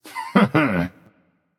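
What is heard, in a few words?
nonverbal heh heh; smite; voicepack; merlin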